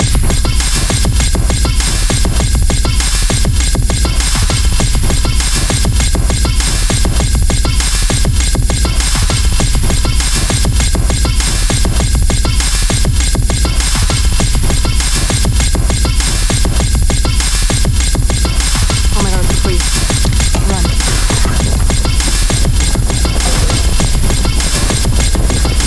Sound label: 4beat